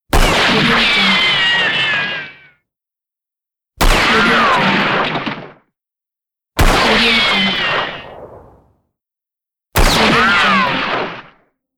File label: spaghetti western gun